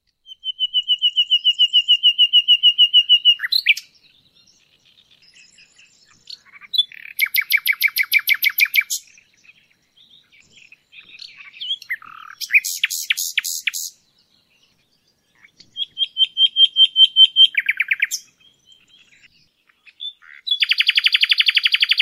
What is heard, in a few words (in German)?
Nachtigall